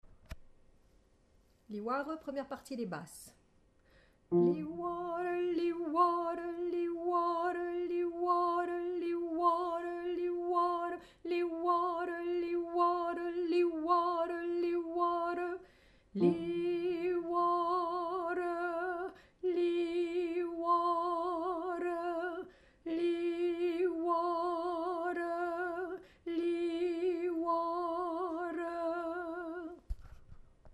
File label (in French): Basse